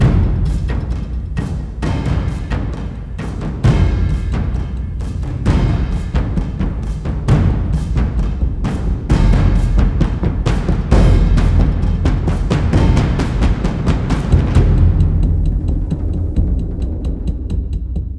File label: Combat Loop